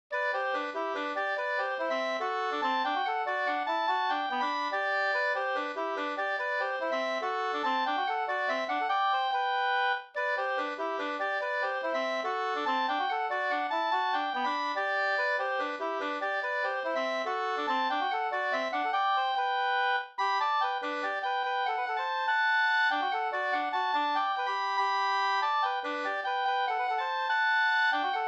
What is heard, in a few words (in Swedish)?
slängpolska; Torp